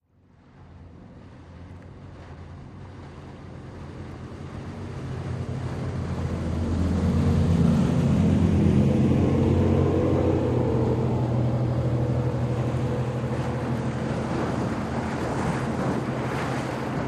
Military Pbr: By, Medium-slow Speed. Wake Splashes On Moored Boat.